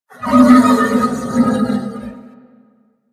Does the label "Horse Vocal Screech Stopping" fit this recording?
Yes